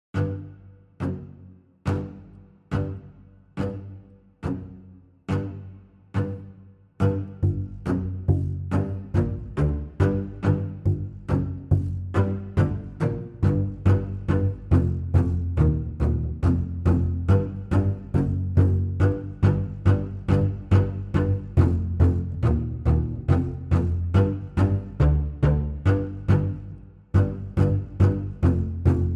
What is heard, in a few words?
Strings Only